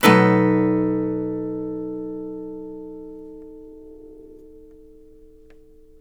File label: CHORDS, Blues Guitar; ILIO; Fretworks